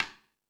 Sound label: FX Sounds, Drum Machines